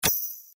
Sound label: reelspin click; YunCaiTongZi